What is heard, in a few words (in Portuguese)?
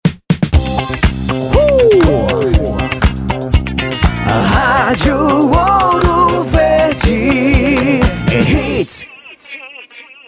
vinheta